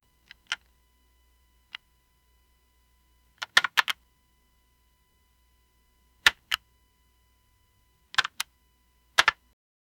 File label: the sound of opening the; communication